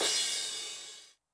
crash cymbal Low, 909; classic machines; 909mod; CC - CRASH CYMBAL

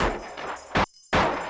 LOOP L, Drum & Bass 160 BPM

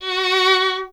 STR VLN, Vln Detache; Miroslav Vitous

